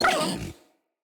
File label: Kubrow Feral Vocal Pain